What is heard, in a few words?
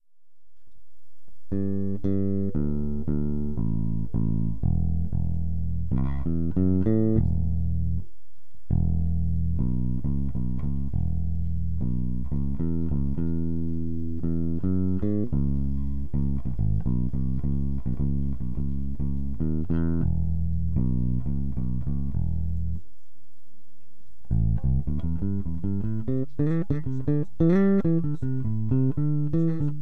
FENDER 8250 NP 45-110